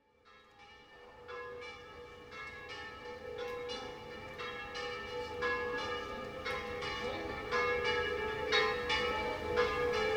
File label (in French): cloches